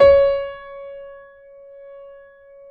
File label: C#4, GRAND P; USB Soundscan vol.17